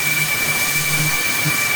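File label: battle cry loop; Xin Zhao; zhaoyun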